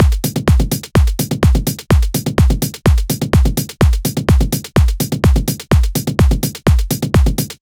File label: Future Rave Kit 126bpm Full, drums; EDM Drums; Drum Kits; Stems; Ghosthack; Ultimate EDM Drums; Drum Mega Bundle; Future Rave Kit 07